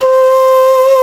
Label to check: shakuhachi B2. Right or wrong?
Right